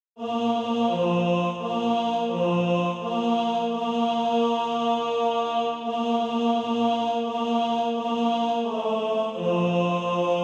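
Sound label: tenor, SATB Practise Music; Christ Is Born; Davis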